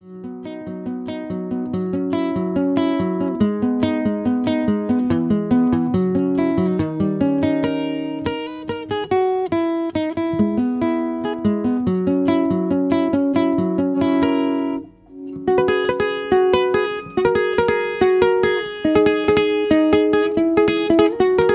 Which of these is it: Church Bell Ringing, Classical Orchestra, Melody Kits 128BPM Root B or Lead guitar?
Lead guitar